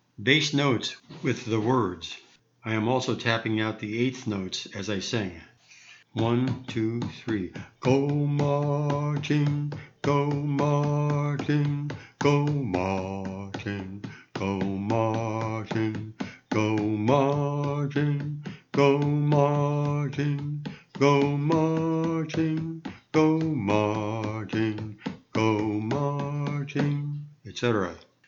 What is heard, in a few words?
bass pattern; Bass "go marching" pattern